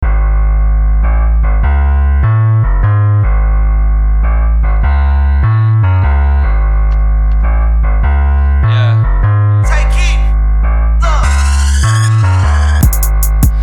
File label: Rain